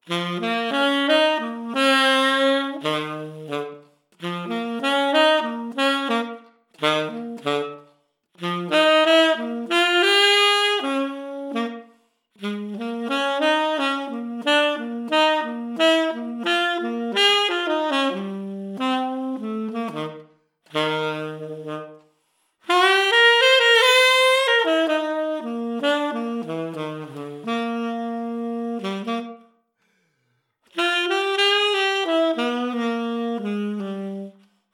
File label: TENOR; Tenor Sax; Lockdown Saxophone Exercises